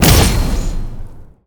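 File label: small explosion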